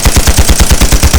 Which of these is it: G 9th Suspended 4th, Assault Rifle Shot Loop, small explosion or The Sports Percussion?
Assault Rifle Shot Loop